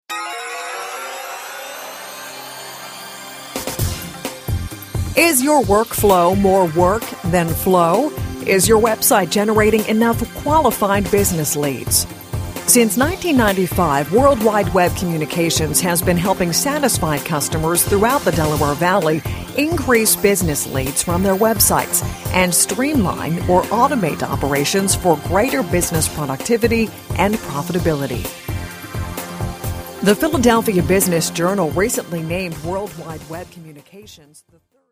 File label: onhold